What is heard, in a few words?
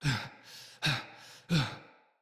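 Cry Heavy Run, Barks; Battle; Envoy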